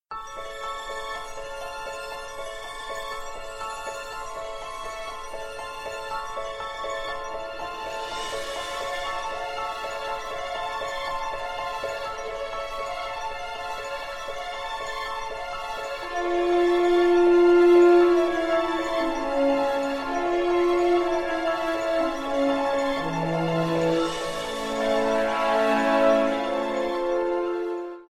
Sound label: Loading